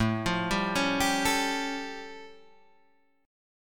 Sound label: A Augmented 7th A, chord; arpeggiated; 5,8,5,6,8,5; JGuitar